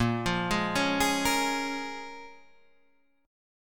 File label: Db 6th